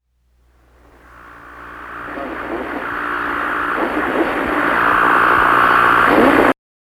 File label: Static Outro